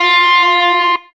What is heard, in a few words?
electric guitar